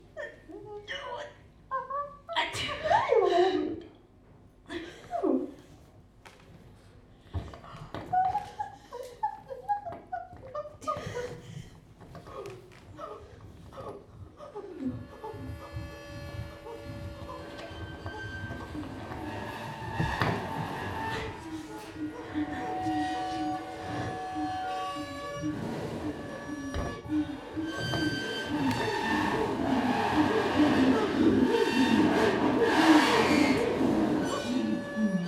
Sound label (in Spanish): impro libre y noise